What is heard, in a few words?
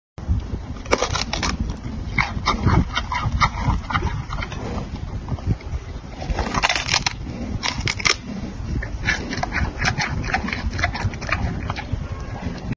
Panda eating bamboo